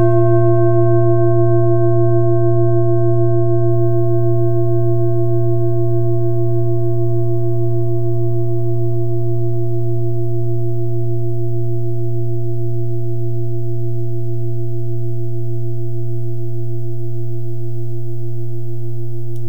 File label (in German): bengalen klangschale